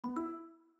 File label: win